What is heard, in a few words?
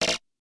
incorrect